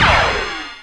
LAZER, OTHER SYNTHS; WS-LAZER; Rubber Chicken Software Sample Bank

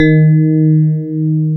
PIA DX E-PIANOS

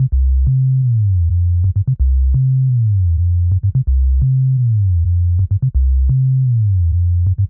128 Fm Deep Stretch Bass, Melodic Loops; Electronisounds

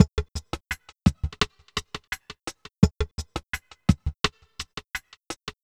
Drum Bass, Drumloops, 170bpm; Zero-G; Total Drum Bass

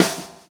Chamber Snare; Sonic Foundry (Sony Creative Software); Drums & Drones; Construction Kits

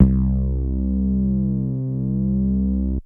Bass Synths hits and octaves

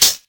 CABA; USB Soundscan vol.51; House Side Of 2 Step; AKAI; PERCS+FX

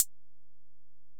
HiHat, Alesis DM-PRO

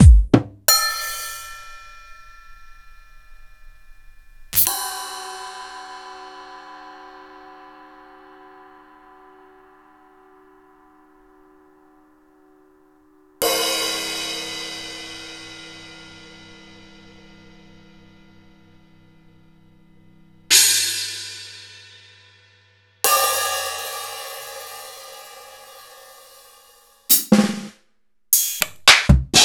EMU PX-7; Sliced; m8

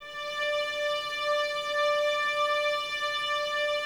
VNS D 5, Violins, String Ensembles; Miroslav Vitous

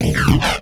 Criket Fill, Esoterik Beatz; Cricket

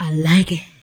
VOX, VOCALS; Ueberschall; Jam Box

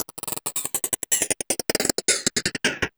LOOPS

